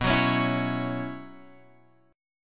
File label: B Suspended 2nd Suspended 4th down Guitar Open F; strummed; JGuitar